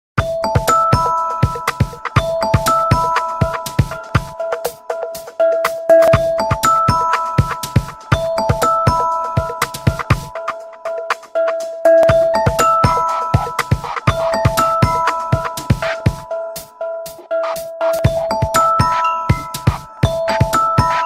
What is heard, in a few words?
Nokia Lumia Ringtone; Ringabout